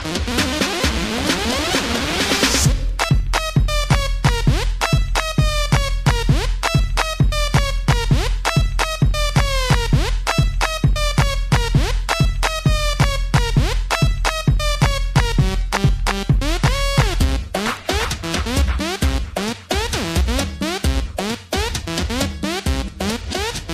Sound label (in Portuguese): pancada mix